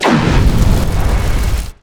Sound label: firestorm; underlord